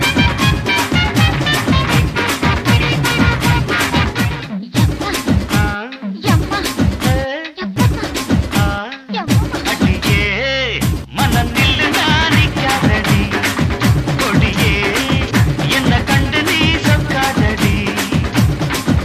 Tamil Remix

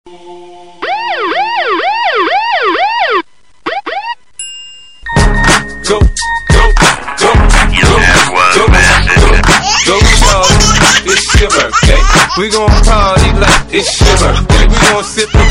Msg Tone Mixture

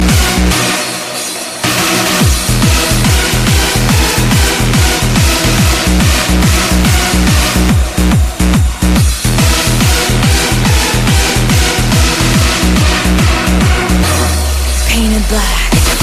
Hardstyle Shuffle

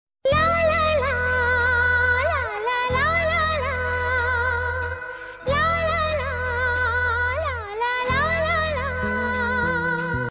Love Melody

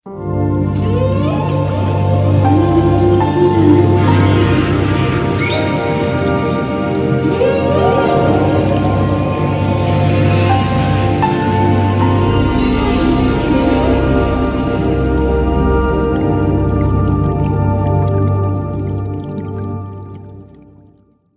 Cool Whale Song; meloboom

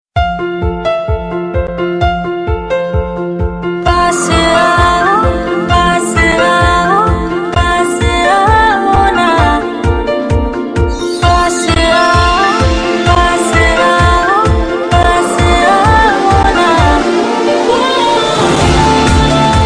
Close Up Tone

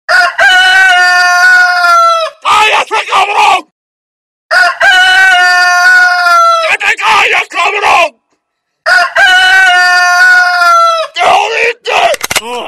Rooster

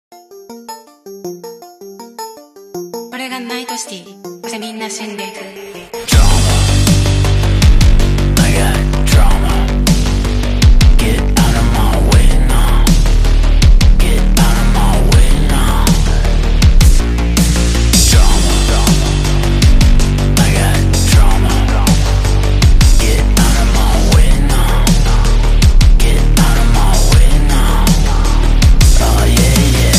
Epic-Drama intro

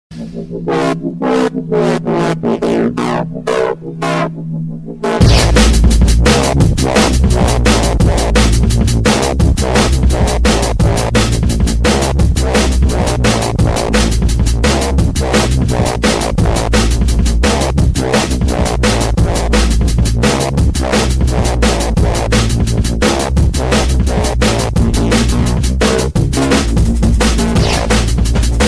Drum N Bass Mix

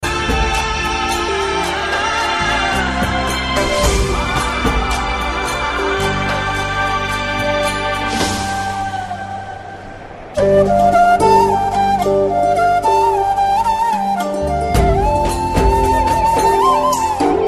Instrumental

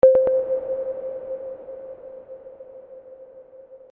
SMS Sonar

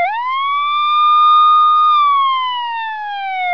Siren Sms

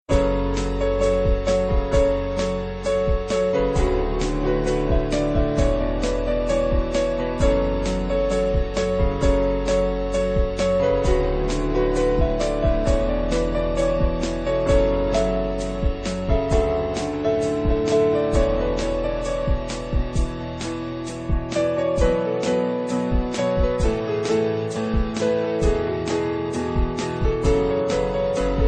Rockstar Instrument

